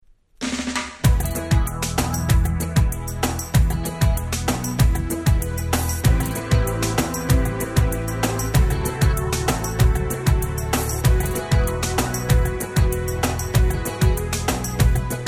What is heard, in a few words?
reggae breakout